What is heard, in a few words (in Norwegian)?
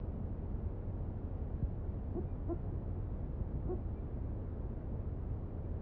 fugl